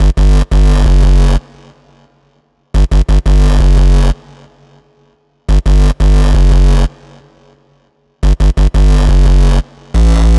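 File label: F minor Chainsaw bass, 175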